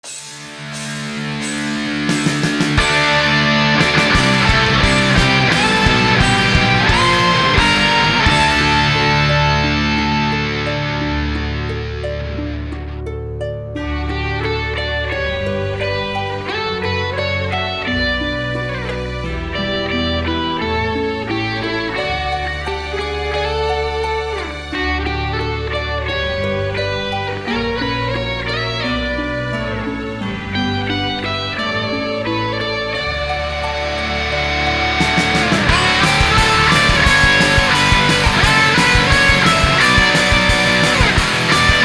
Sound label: Rock Cover; Super Mario 3D World